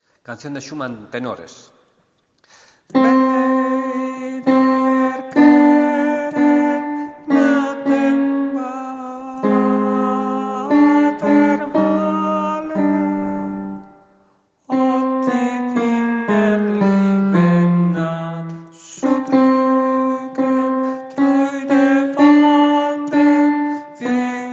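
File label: tenor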